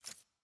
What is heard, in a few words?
chat window open; premade voice